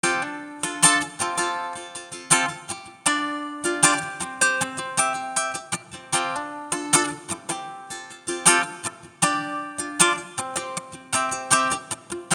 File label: Strum